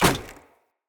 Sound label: Sphere Polearm Medium Impact Wood Hollow Large; Player; Environment